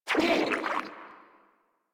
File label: Frogman Pain; Vocals; Warframe; Grineer